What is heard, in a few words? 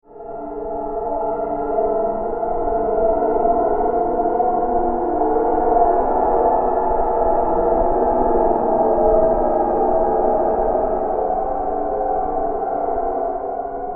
Dead Souls Horror Background; Ghost Sound Effects